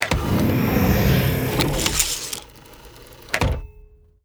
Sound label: door close; doors